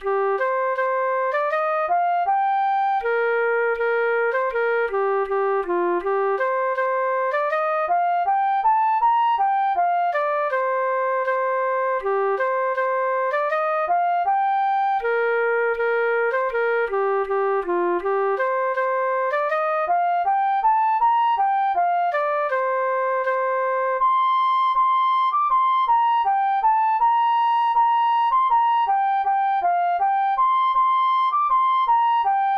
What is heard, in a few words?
Polka, recorder